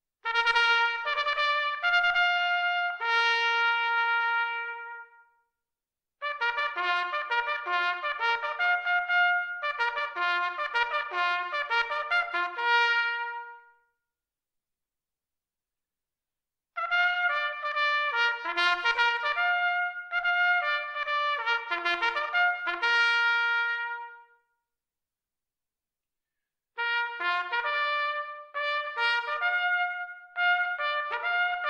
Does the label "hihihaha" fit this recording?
No